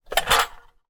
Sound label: household safe small desktop metal open